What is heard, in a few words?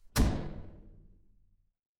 door close